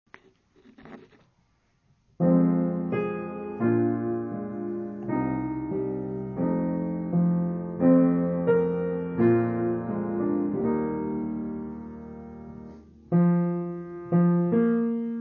bas og tenor

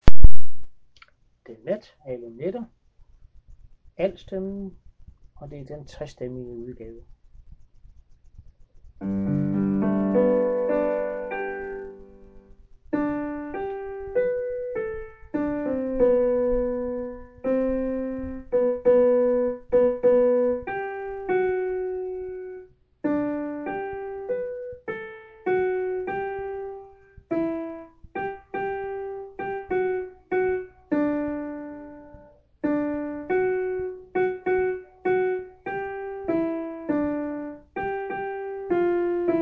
alt 3 stemmig; Den nat af alle

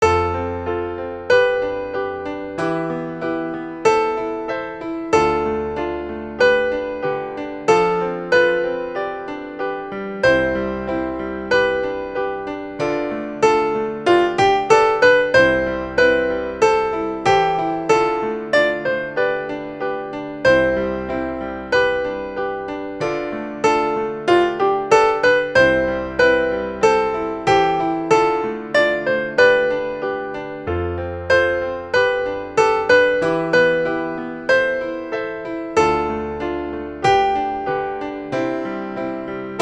1. Sopran; Anemonesangen

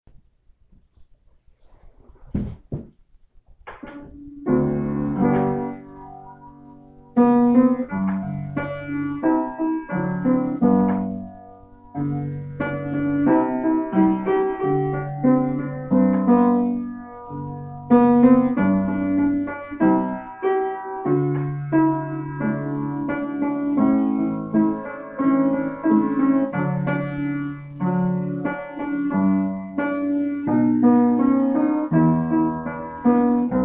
alt stem

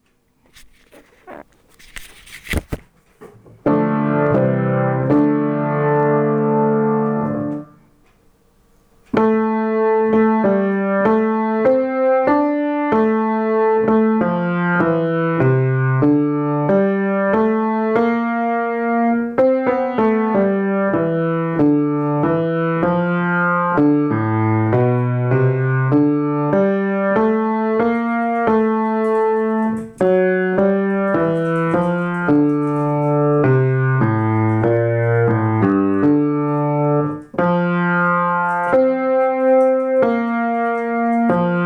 bas tenor

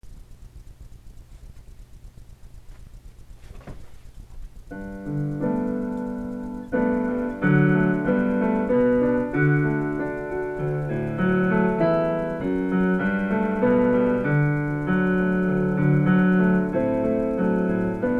akkomp

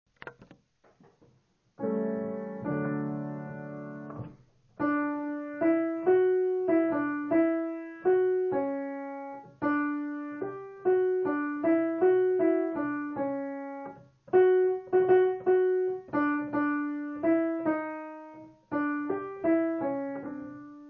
alt stemme

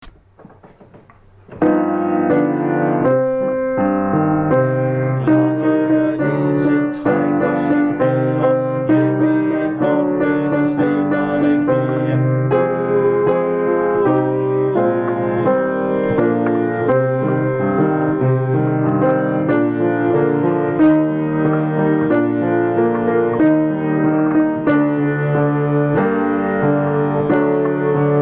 alt stemmen plus akkonpagnement; stemmefiler; Aeselsangen

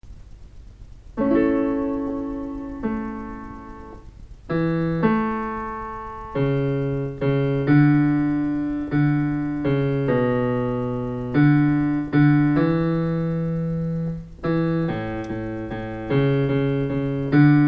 bas satb

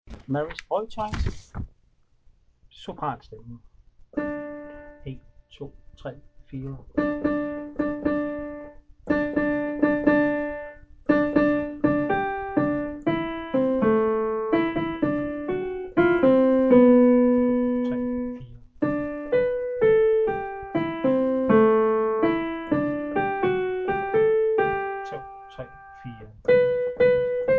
sopran sab

